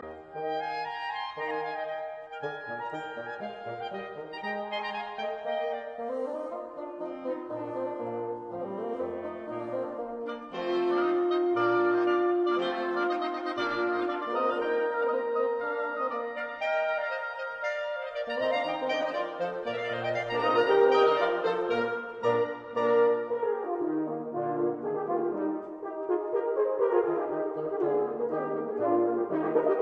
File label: Octet Rondo Allegretto; Octet No 2 in E flat major